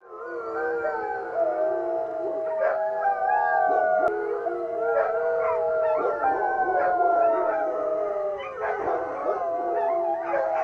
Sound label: dogs howl